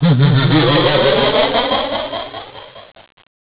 Evil Laugh; Deliantra

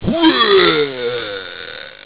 puke; deliantra

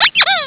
squish; Deliantra

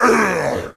orc die; deliantra

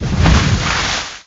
water blast; deliantra

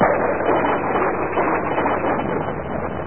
lightning; deliantra

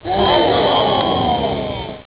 Missed; Deliantra

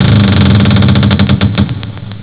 Creaky; Deliantra

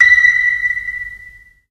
sonar ping; deliantra

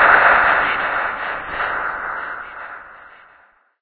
whispers hit; deliantra

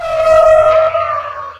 wail long; deliantra

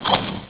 Tee swing; Deliantra

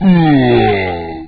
troll die; deliantra

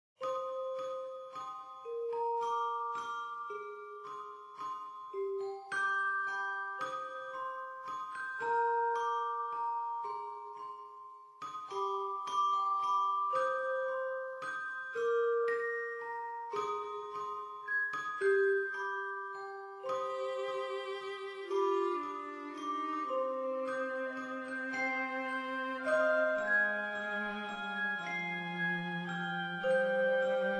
fairytale waltz; deliantra

